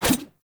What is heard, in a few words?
Large Dagger Whoosh Heavy; Weapons; Enemy; Daggers